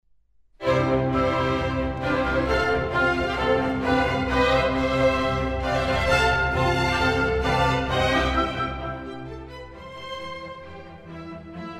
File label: Oboe Concerto in C major- Allegro; Allegro aperto